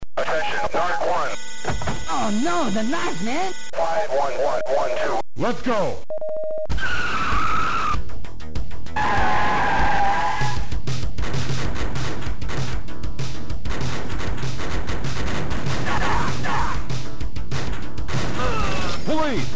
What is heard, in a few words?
gun